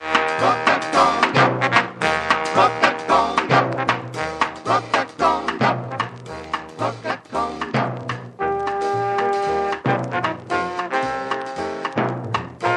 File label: rock a conga